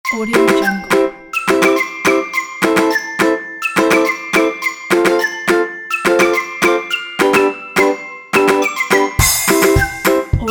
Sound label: merry wistling bells; sweet-honey